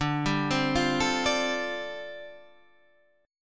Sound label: G 7th Suspended 2nd Suspended 4th D